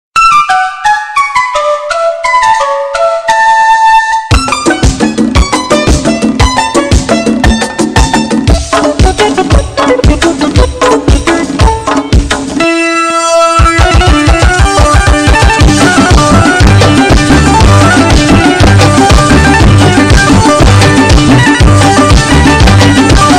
nokia tune ring remix, ringtones

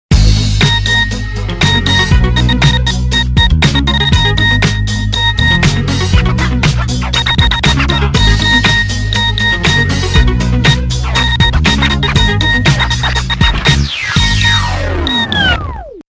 nokia sms dance remix fast, ringtones

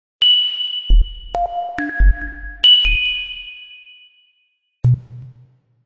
crystal, ringtones

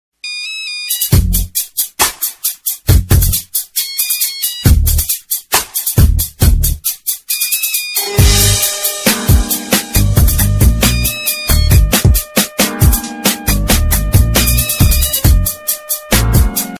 nokia remix, ringtones

